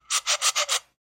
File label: Bycicle Horn; SoundsCrate